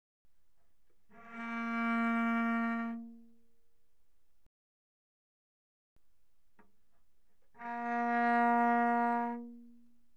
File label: Cello arco pp sul G Bb3Gb4; Strings